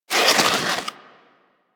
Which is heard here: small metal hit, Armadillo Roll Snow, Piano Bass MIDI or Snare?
Armadillo Roll Snow